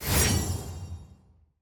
ticket entered; lol clash

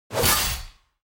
attack; diana

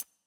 button pip click; champ select

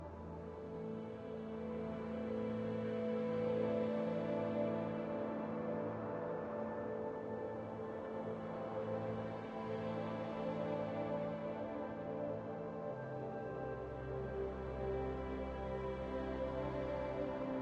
music in queue loop; tft; teamfighttactics

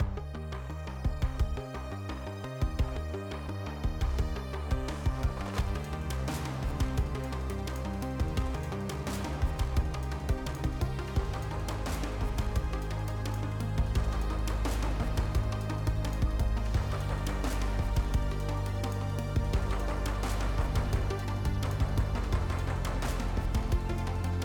music blind pick; project

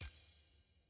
ui page forward click; lol

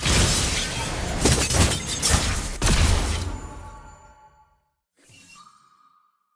tier wings promotion; promotion to emerald; ranked

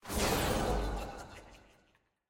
fanfair appear; shaco

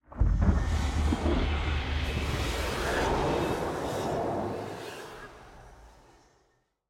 harrowing distant

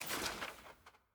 sfx ui record next click

